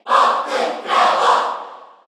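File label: Cheer Korean, crowd; Piranha Plant; SSBU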